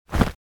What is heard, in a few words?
Ice hockey player hockey gear puck hit movement